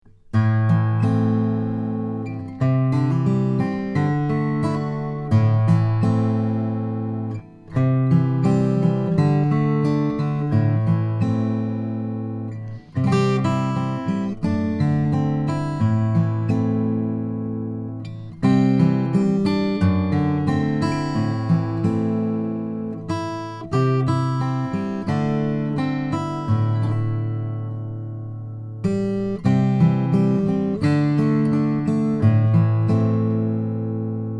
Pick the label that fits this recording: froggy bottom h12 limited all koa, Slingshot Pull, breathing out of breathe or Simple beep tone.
froggy bottom h12 limited all koa